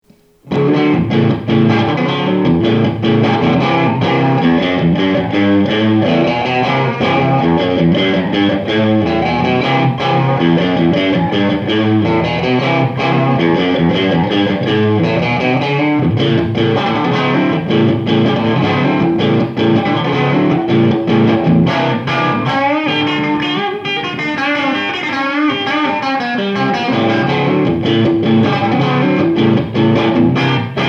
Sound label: stratocaster clean low gain